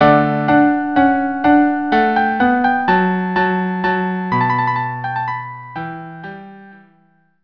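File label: minuet violin cello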